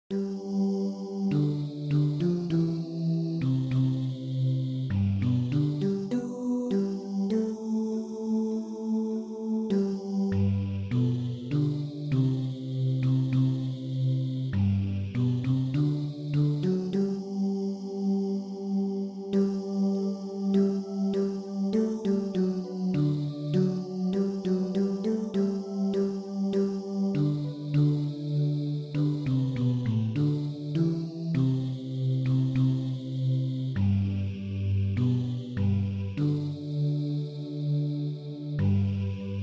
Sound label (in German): bariton